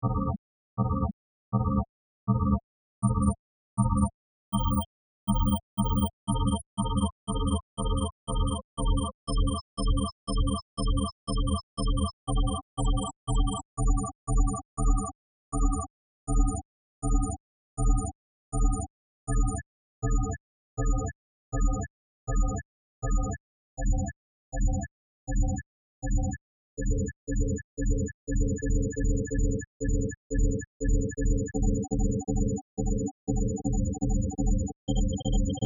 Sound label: staccato prime soup